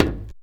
BIG TAMA HIT; Best Service; World Colours

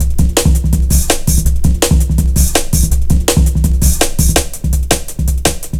165, Drum Loops; 90 s Sample CDs; Total Drum&Bass

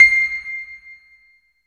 JV E GRAND, JV-1080, PIANO

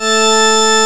DIDI, SYNTHESIZER, DIGI WAVE; AKAI S6000 CD-ROM - Volume 1

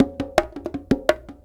Perc Loops, 165; Drum&Bass; Joy; EZRollers

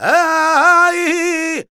voc c, Flamenco Vocals Male Cantaor; Male Cantaor 2

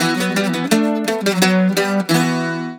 Celtic Flavours; USB Soundscan vol.42; AKAI

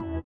sitar, Indiatek; Spectrasonics; Metamorphosis

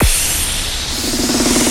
Fills, Loops 140 BPM

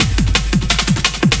loop, 170 BPM, drum & bass

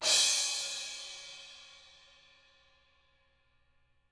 ZILD MALLET, Drums

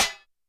Metal Noise; YAMAHA RM1X

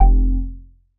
bass classic F; Polyend; ARC Noise

